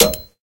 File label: gear select